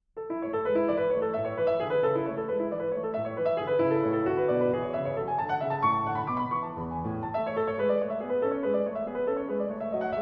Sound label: Harpsichord Sonata in A Major; P 893.06; Paradies